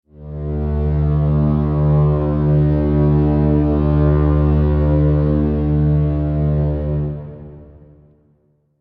Fog horn honk blast ship

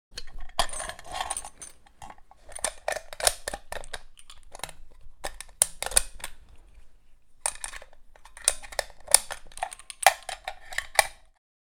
Sniper rifle brass shell foley movement

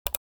Cute click

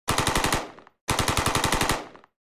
M16 firing multiple bursts

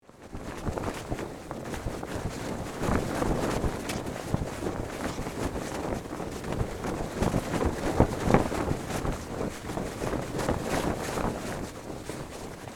Ship flag flapping flag pole no wind

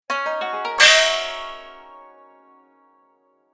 Chinese transition cartoon asian inspired

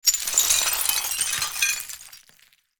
Broken glass rattle small shards

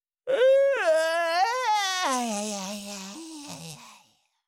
Cartoon little monster voice yawn

